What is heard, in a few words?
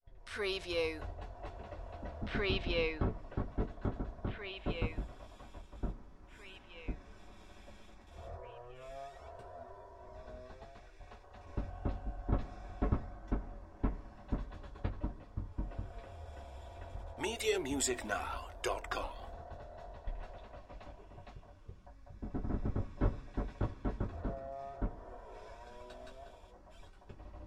Haunted House; sound-effects-bible